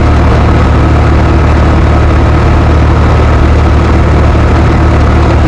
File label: stryker ext idle; veh stryker ext idle